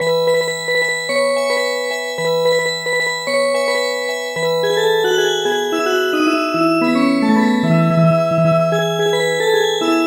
Music Box; He is born

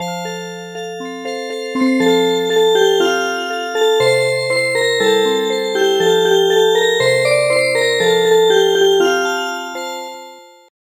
Music Box

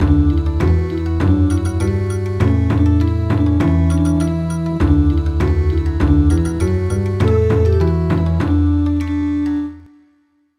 Bon Odori

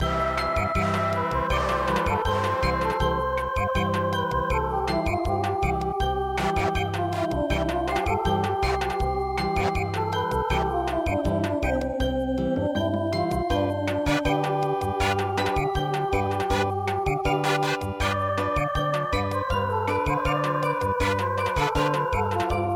Samba; Bolero

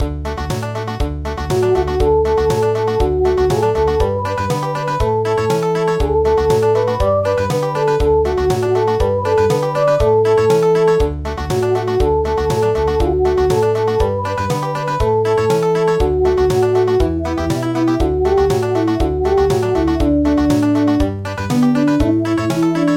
Country

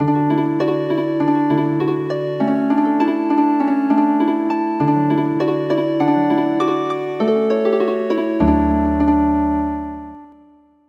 Healin Harp

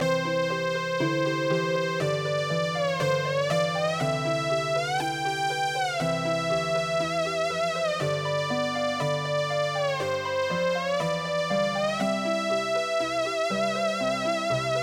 theremin; Oshogatsu

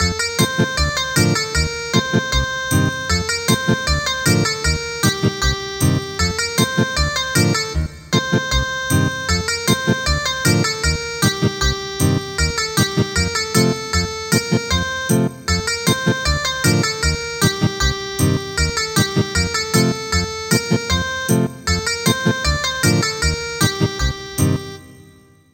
Ethnic Guitar; Lorig

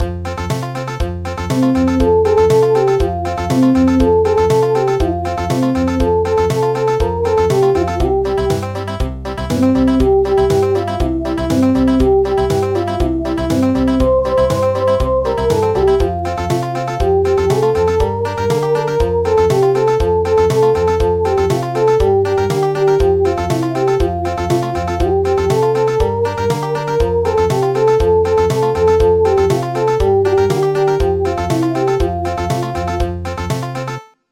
Country, midi

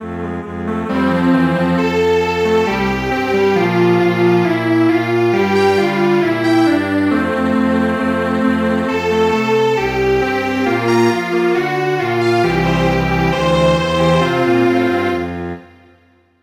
Strings Quartet

